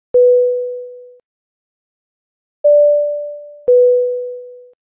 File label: seat belt sign sound